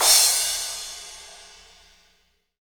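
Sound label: CYM CRA, crash cymbal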